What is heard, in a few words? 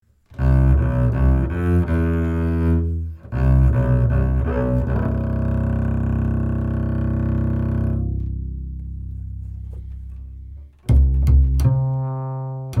upright bass; lewitt